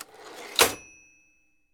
TYPING, TYPEWRITER

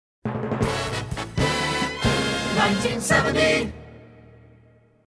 Hits, Drops

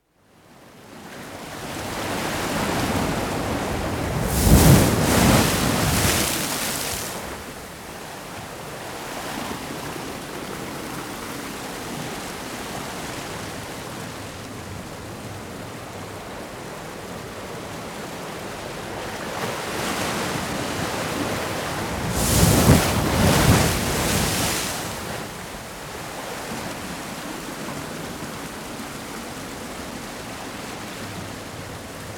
Waves Crashing Through a Blow Hole In the Rocks; Dr. Sound Effects; 210 Nature Sounds - 20 Hours of Relaxing Natural Ambiences for Meditation and Sleep

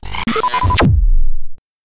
impact

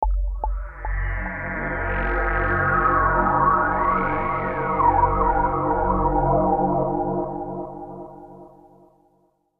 Bass F#; Beds

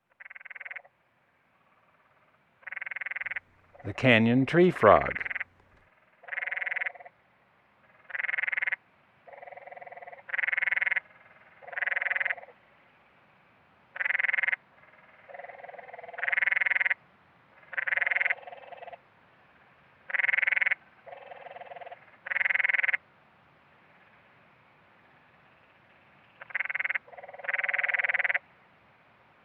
Canyon Tree Frog; Western North America; Dan Gibson; Wildlife Identification By Sound

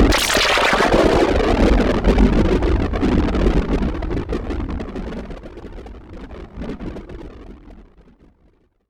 THUNDER, synth fx

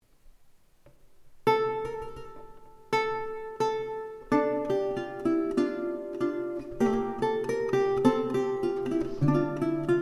Recercar, Lute; Il Rosetto, Domenico Bianchini's Lute Book (1546)

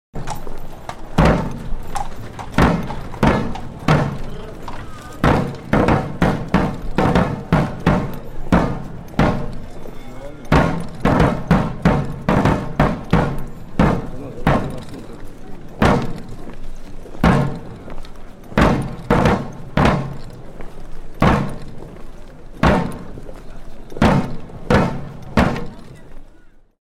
Infantry March with Drum; Historical; Hollywood Edge Historical Series; War Horses Armies & Weapons

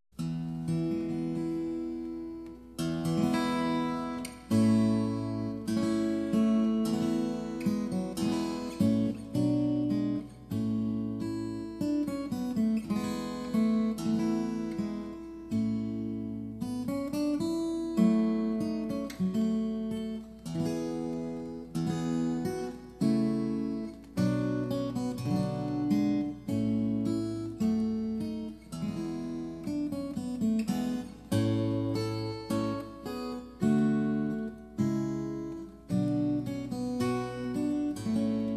recercar, steel string guitar; The Siena Manuscript

